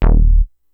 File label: Synth oneshot Stab Eb, Synth Bass One Shots; Slate Digital BASSHOLE Sample Pack